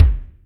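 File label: Bass Drum stomp, Acoustic Kick; Yamaha DTXpress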